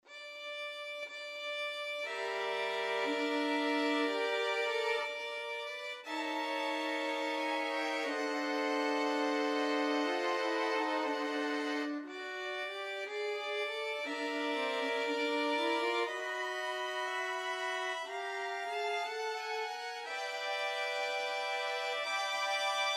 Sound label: happy jazzy birthday, violin ensemble